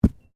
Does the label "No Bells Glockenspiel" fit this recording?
No